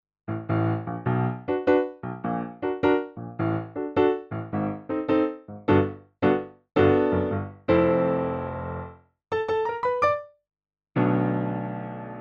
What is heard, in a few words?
Roland FP90 Upright Piano